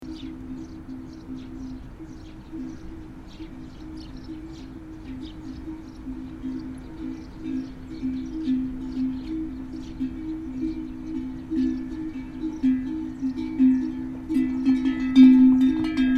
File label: donkey train